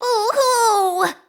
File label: boohoo; monokuma kid